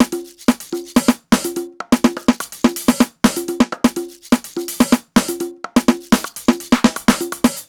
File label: top basic no Kick, 125 Beats